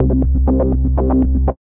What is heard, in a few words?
Club Level Shorthand, Synth Rhythmic; Instruments; Wavetable; Ableton; Factory Packs; Synth Essentials